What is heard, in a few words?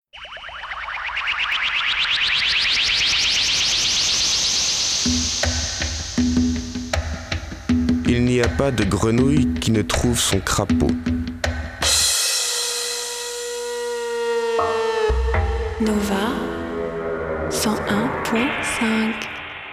Radio Nova Jingle; Nova 25 ans - 1998